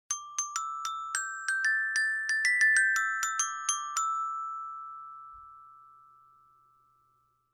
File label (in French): Son Metallophone